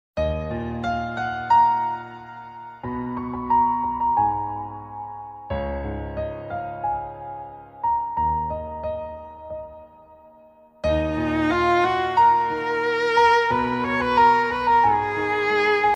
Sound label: instrumental ringtone